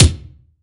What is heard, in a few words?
BD, BASS DRUMS; EdgeSounds